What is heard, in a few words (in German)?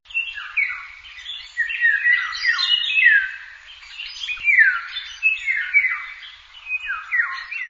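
Pirol, Golden Oriole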